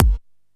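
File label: Bass drum Tuned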